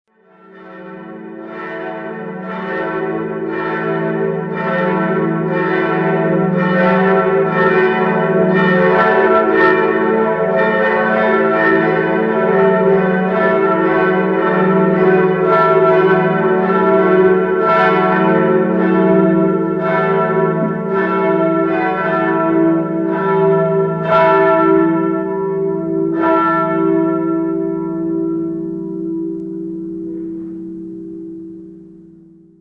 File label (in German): Glocken